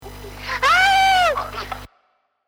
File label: animal